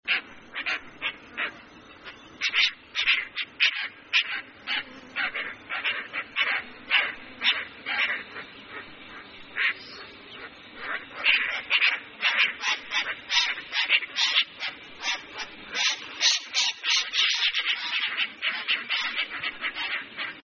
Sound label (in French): rollier a longs brins